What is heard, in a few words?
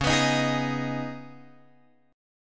Db 7th Suspended 2nd, Guitar, Open F, Db7sus2 chord; JGuitar